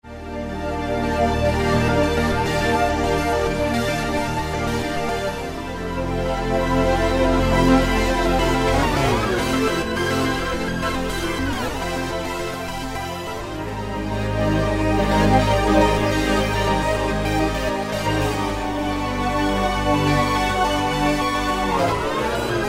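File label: Hold & Sample, Alesis QS8; Factory Preset examples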